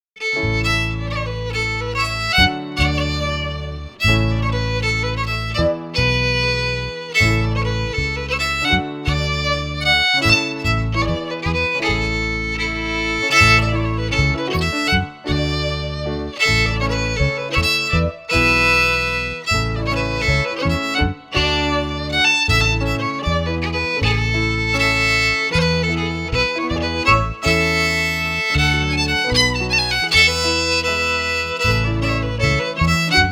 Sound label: FIDDLE PIANO; Jink & Diddle Book o' Tunes 2022